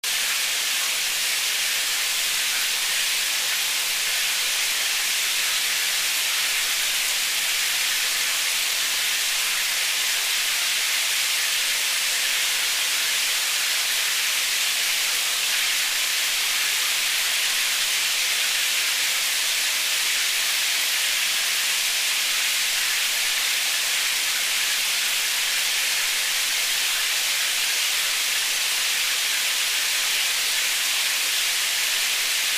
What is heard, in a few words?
rain, ambience sounds; Rain 5